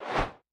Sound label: Obstacle Oven; In Obstacle Oven